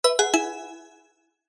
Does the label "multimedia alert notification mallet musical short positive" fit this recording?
Yes